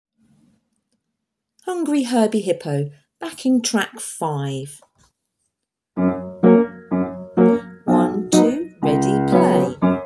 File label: backing track, keyboard and piano